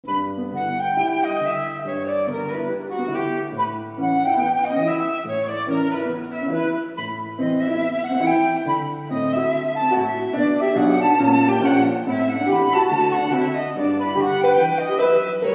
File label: Ragtime